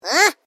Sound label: Created Creature Small Aww, Alien Sound Effects; Created creatures, vocalisations and pretend speech